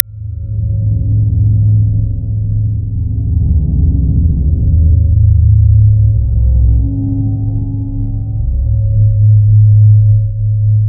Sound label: psy blackout; affects